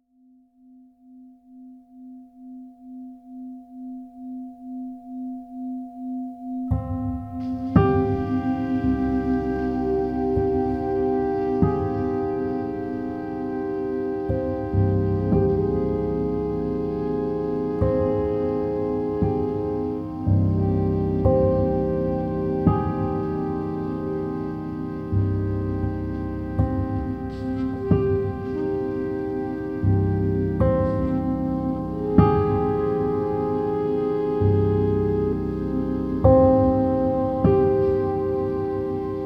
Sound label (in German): saxophon gedreht; fluegel